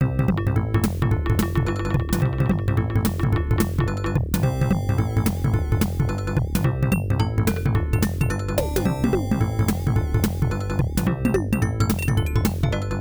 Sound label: At the Beach Cucco Whistles Matching the Trumpet; dragon half; fm towns